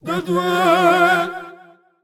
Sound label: Attack Vocals; Pharaoh